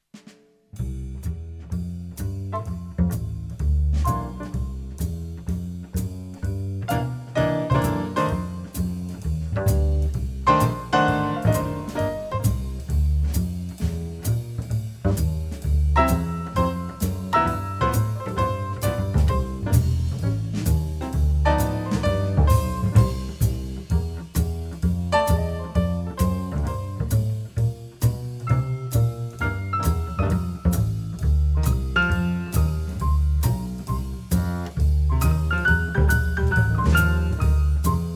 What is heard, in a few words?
Blues